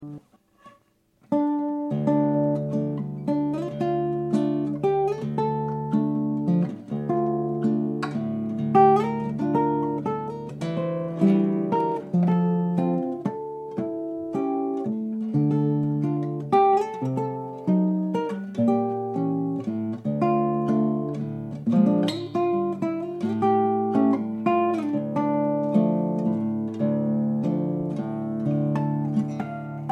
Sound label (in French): Enregistrement DAT de salon Pas deffets sonores pas dégal, guitars; Ballade; par Silvestre Borras; pas dégalisation